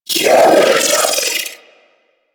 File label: Sentient Battalyst Vocal Attack; Warframe; Sentients